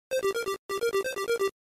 ring; Hydrocity

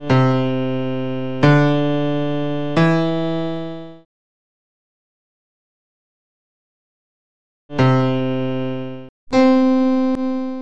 Iphone5 Sms Classic

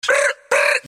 Chirp

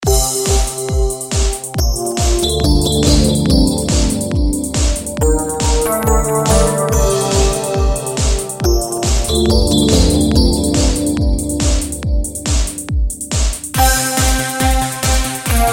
Cosmic Ringing

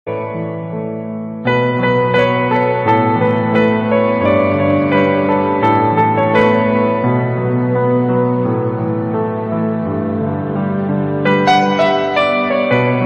Piano

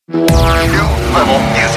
New level music

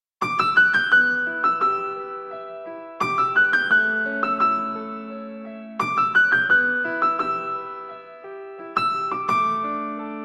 Kick Ringtone

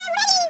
Pluck; Pikmin

